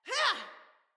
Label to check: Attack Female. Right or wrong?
Right